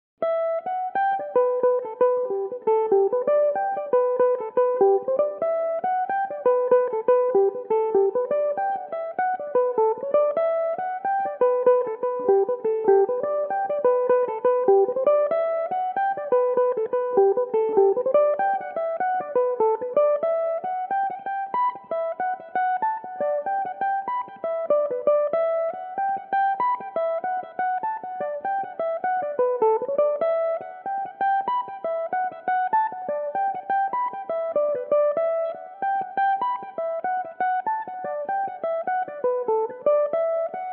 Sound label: castlebar races jig g major, mandolin; Belmuse